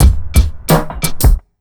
BEAT, Underground Hip Hop

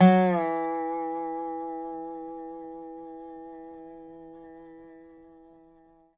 dobro; DOBRO DOWN; Country

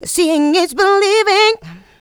Female Vocals; Houseworx

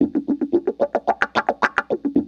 WAH PATTE, Funk Gtr & Wah

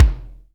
Reverb Kick Snare; PS-37 Dance Synths; Percussion

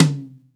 TOM, DRY+AMB TOM

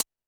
Roland TD-20 Percussion

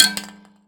Finger Hit, Prepared Kit; Battery 3 Sample Kits

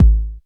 K909, KICKS TR 9+8

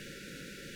ORG Farfisa Flts; Flt 4'; Keyboards of The 60's and 70's

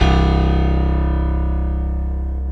PNO, SY PIANO, House; Best Service ProSamples vol.10; AKAI

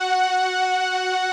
505, String Machines

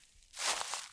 Rustly Step, Footsteps, outdoor

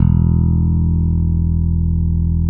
FBASS, Electric Basses; PlatinumPhattCD2; E-MU Producer Series Vol. 8 – Platinum Phatt

